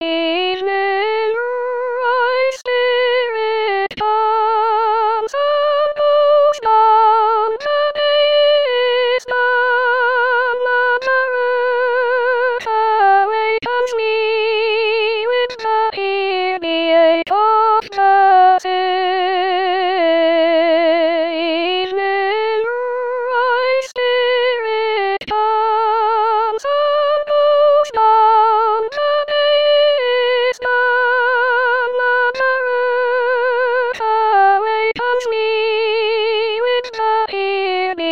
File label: Soprano